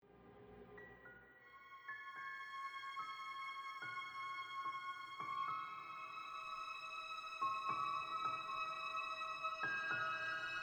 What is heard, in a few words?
Mystery Strings Build Production Music